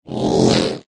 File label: Drone